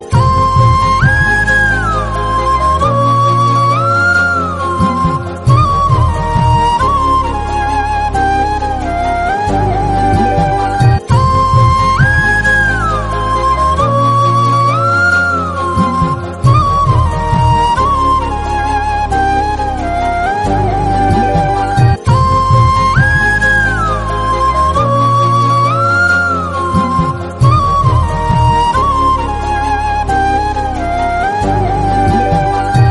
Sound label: heart touching romantic